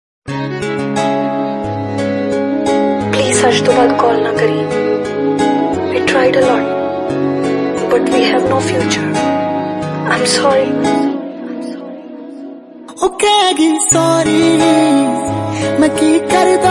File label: Breakup Song Ringtone